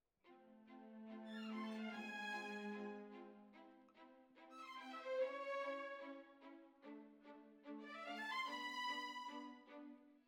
Violins Over

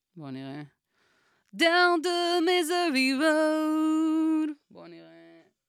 Vocal

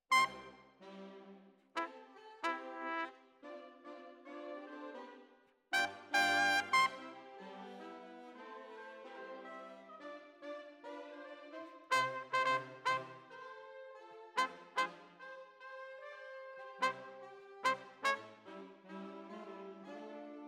Trumpet

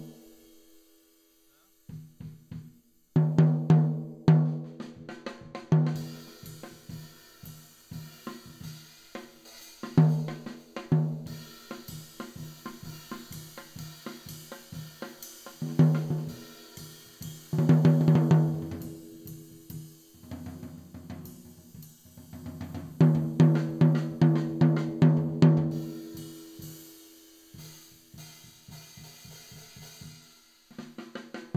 Tom

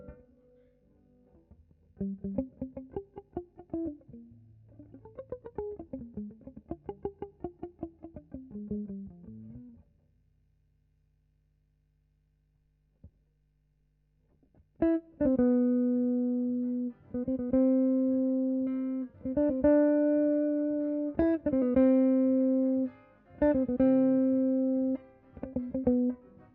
GTR B

